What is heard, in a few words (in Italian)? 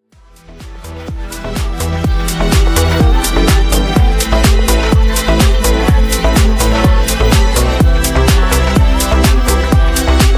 Groove Continuo; Sanremo